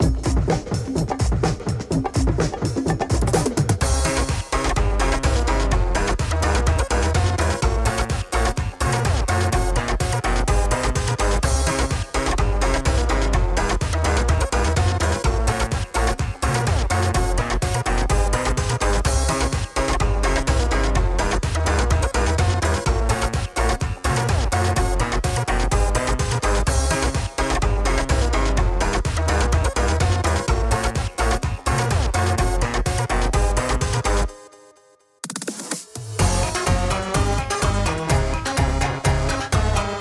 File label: Instrumental; Friday Night Funkin Hello John Doe; Rockhard